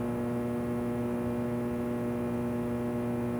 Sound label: office room tone; Counter Strike Model Pack; CS:GO